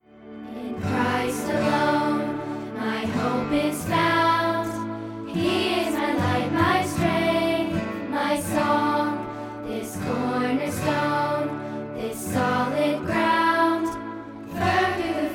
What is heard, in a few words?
instruments